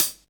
HAT RAGGA, Hi-Hats; AMG; Now CD-ROM (Roland); NOW! Drums

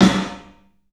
PA DEEP R, CLUB SN VRB; 3D Sound; 3D Drums

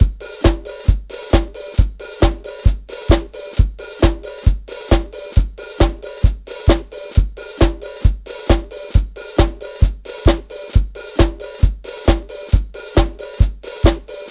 Drum Kit, Electro Lounge; Sonic Foundry (Sony Creative Software); 134 Six On Nine

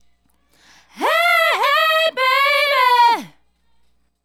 Female Group, Hip-Hop and RnB Vocals

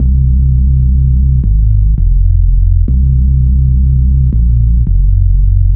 Menace Throb Bass, Bass Lines 166.5; Jungle Warfare 1&2

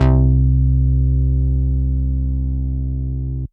Synth Prophet Bass C; Electronisounds

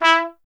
TP E; Miroslav Vitous - Solo Instruments VOL-1; Trumpet

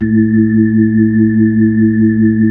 B-3, Brite

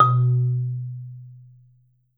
SOFT B1, Marimba; Sampleheads; Dave Samuels Marimba & Vibes

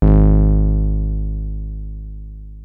303 F#2, TB 303

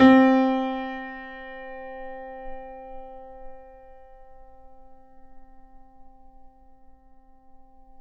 Mf C 3, Grand Piano off mic; AKAI S6000 CD-ROM - Volume 2

